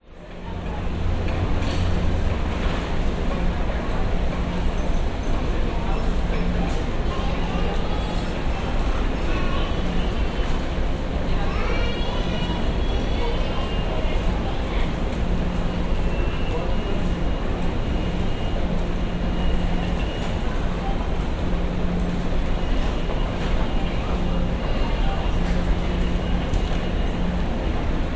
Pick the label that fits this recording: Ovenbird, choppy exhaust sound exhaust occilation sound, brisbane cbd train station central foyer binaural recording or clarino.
brisbane cbd train station central foyer binaural recording